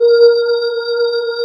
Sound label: CHORIA PAD; East West; Global Sounds